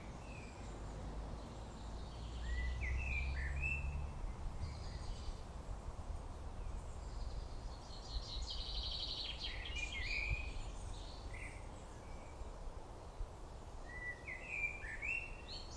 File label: woodland bird song; motherwell